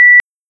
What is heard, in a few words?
beep